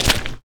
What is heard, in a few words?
fs fm paint, footsteps; Portal 2